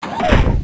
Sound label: arm Swap; PC Gamer IT CD 48; Big bang